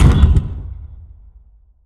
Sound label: DAMAGE alien Ruins Wall; Barotrauma; Room FX